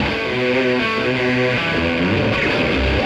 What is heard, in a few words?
Electric Guitar, Big Rok; Sonic Foundry (Sony Creative Software) - Friendly Fire